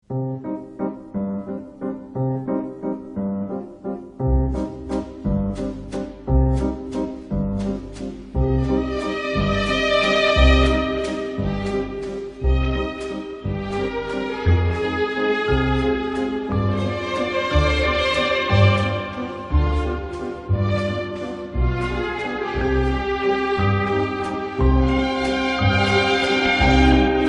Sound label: Gramofon Waltz Gramophone